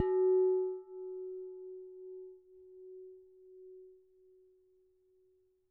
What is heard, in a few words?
Struck Vase